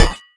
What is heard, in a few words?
armadillo shielded; Crypt of the NecroDancer